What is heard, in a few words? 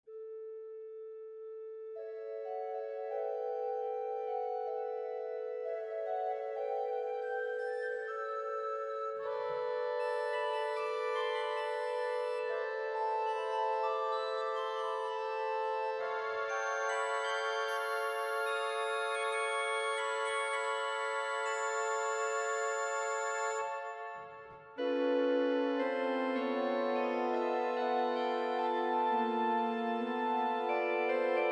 Crescendo, organ; organteq1